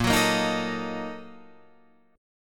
A sharp Major 7th Suspended 4th Sharp 5th, Guitar, Drop D